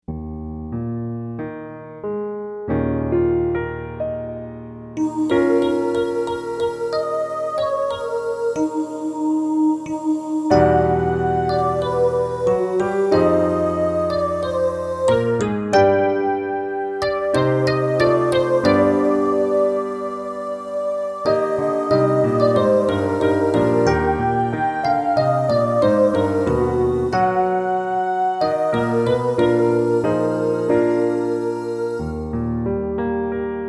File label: PIANO Study HIGH